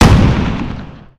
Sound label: basilisk fire, weapons; Dystopia